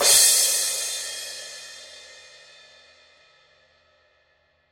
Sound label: formula 602 classic sounds 16 thin crash edge